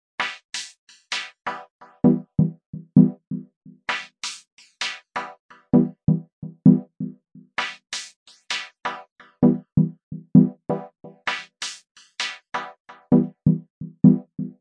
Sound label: dark tiny synth vamp, synth and electronic loops